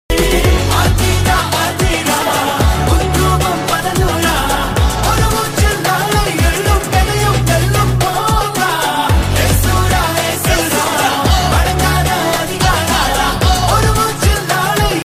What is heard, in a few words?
song tamil; cobra